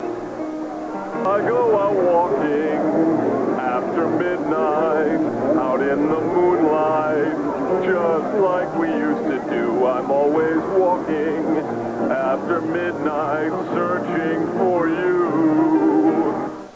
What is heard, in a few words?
Wedding Song